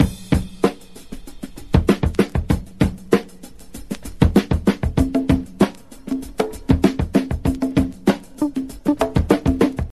97 bpm high quality drum groove e key

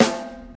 00s short reverb steel snare drum sound a key

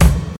puffy top end kick drum single hit b key

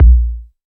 perfect kick single hit a sharp key; kick drum samples

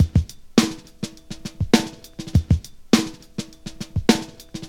111 bpm drum groove e key

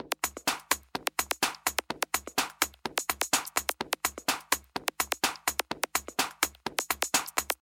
cold techno top loop; drum loops

